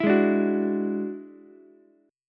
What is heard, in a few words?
F sharp 7th Suspended 2nd Sharp 5th F sharp down Ukulele; strummed